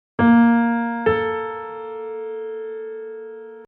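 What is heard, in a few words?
Major 7th Separately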